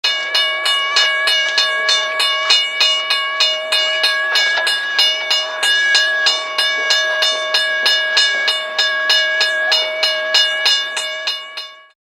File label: Modern Railroad Crossing Bell; Musical